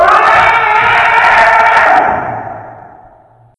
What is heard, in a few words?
chase; slender; trypophobia